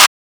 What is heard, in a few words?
Claps